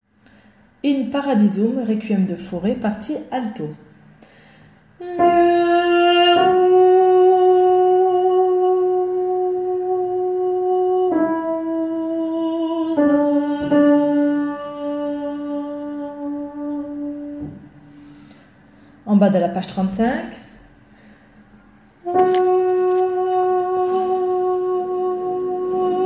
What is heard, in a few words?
Alto; In Paradisum